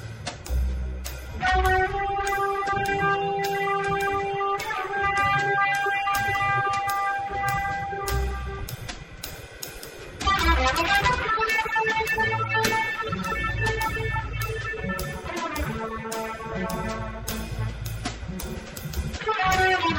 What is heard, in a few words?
Bass Drums; ICASSP11; DUET